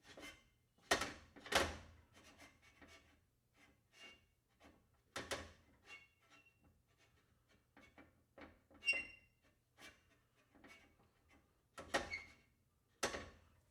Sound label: pot on stove metal